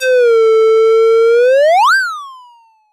jump

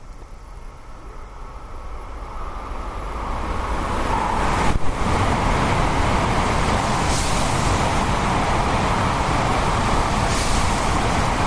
train passes

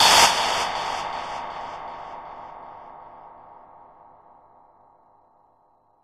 shock; animal

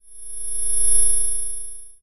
spaceship door

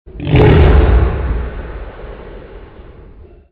roar of beast; animal

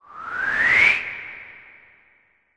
motion; animal

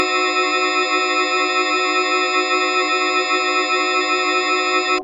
fear; animal

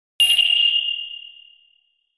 freeze

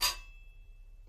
metal hit

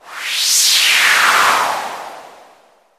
warp

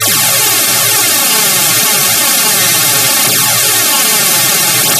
laser gun; operation

